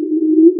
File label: gw chirp